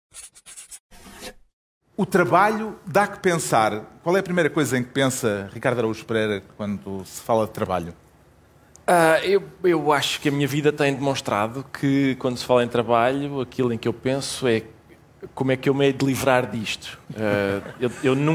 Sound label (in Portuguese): RAP